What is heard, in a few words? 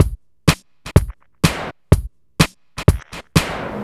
Drum Loops, 125 BPM